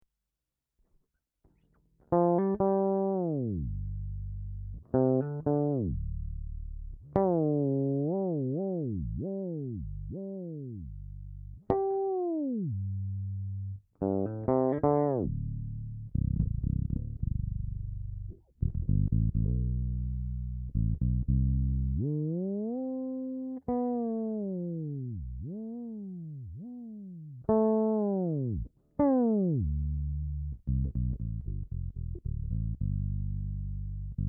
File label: whammy moins 2